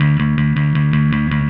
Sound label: Happy Pub Bass; Sonic Foundry (Sony Creative Software); Indie Rock Anthems; Session 05 - Happy Pub